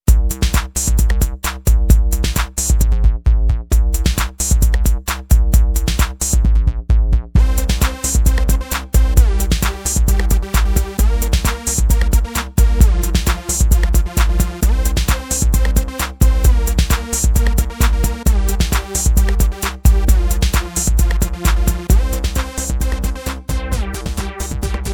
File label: Dance Pattern; Venom